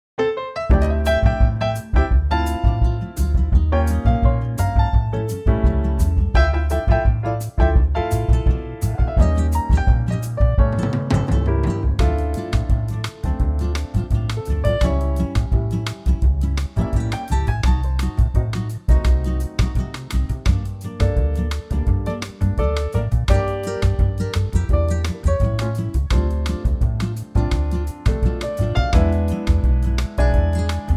BOSSA NOVA Dm

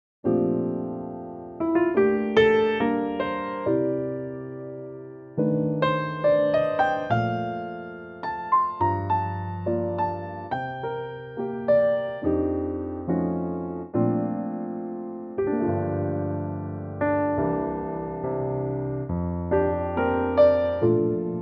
Bb piano

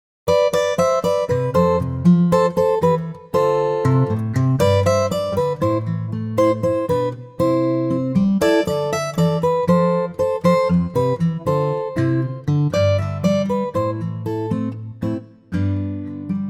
Am Acoustic Guitar

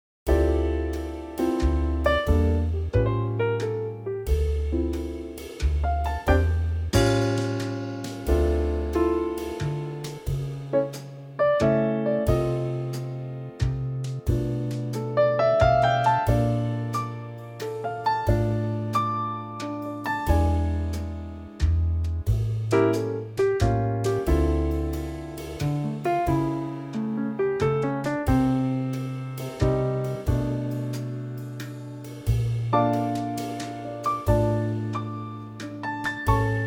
Dm trio; key - Dm - vocal range - C# to Eb; Classy Trio arrangement of this gem from the movie "Chitty Chitty Bang Bang".

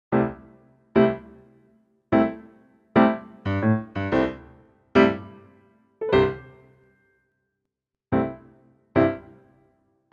piano; key - C to Db to D - vocal range - G to A; Superb piano only arrangement, with easy and light comping just keeping the feel and groove

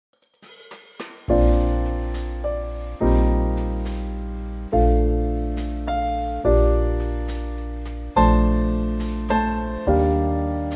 Trio; key - Bb; Gorgeous Trio arrangement of this superb ballad

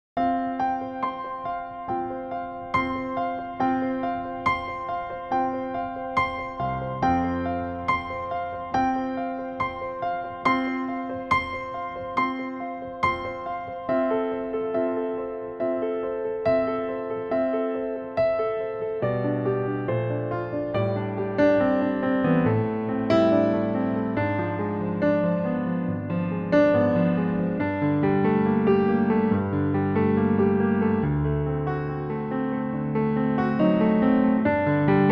F Piano; key - F - vocal range - C to D

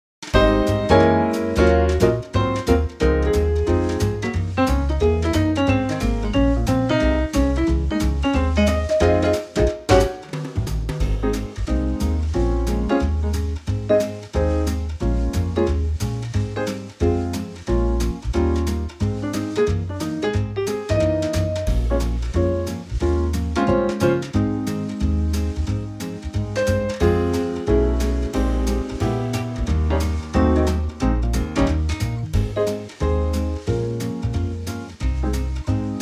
Ab 180 bpm; key - Ab - vocal range - Ab to Ab (optional Bb); Superb quintet arrangement

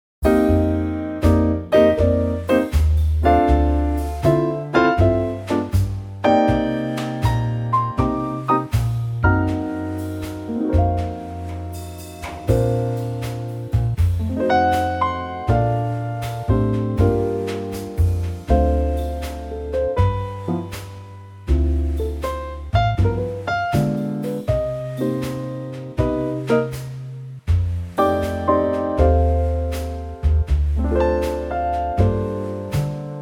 Bb trio; key - Bb - vocal range - A to A

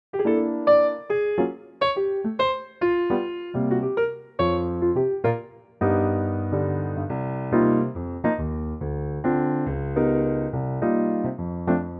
Bb piano; key - Bb - vocal range - C to D; Popular old jazz standard in a 2024 piano only arrangement.; Pianotrak

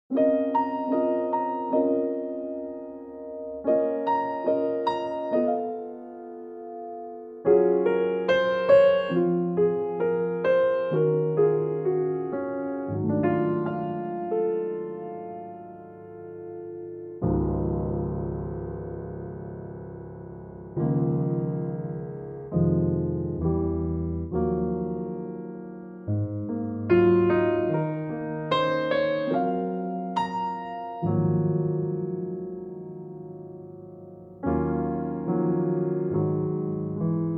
Db piano

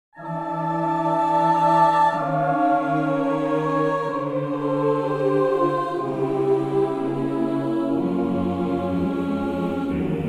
choir Bb; Vocalville; key - Bb; Refreshingly different choir only arrangement of this gorgeous old christmas classic.

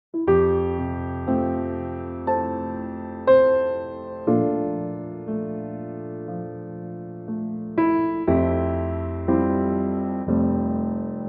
F piano; key - F

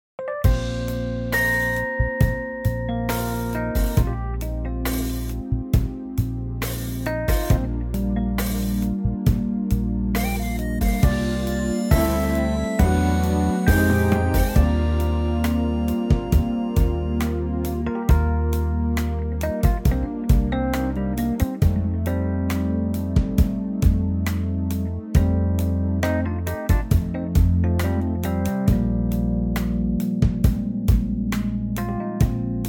Eb groove; key - Eb - vocal range - Eb to Ab

